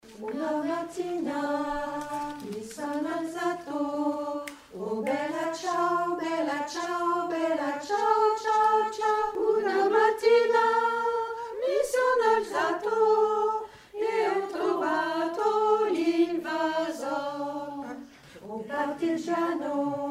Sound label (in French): alto sopranes altos; bella ciao